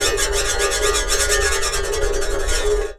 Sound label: Raw, Wire & Tin, contact mic; musicradar; contact mic madness